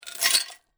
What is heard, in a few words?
Metal Chain Drop On Ground; Chain sounds